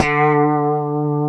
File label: D, Guitars; Spectrasonics; Hans Zimmer Guitars VOL-1; COMP POP+VIB